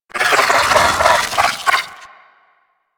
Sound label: Amalgam Moa Satyr Vocal Idle; Warframe